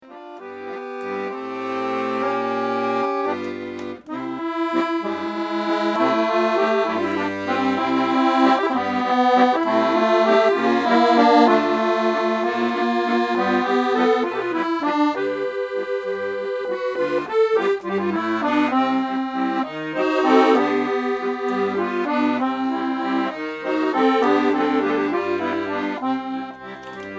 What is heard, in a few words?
French Accordionist